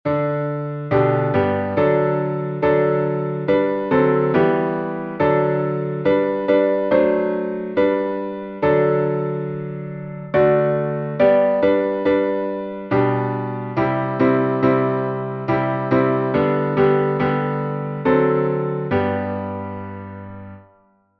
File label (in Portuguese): salmo instrumental